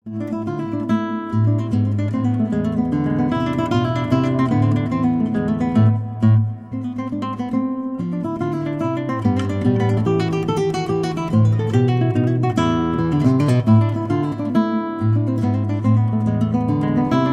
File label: Gavotte